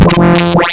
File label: monowave